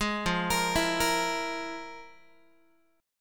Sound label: F Minor Major 7th Double Flat 5th, Guitar; FmM7bb5 arpeggiated; JGuitar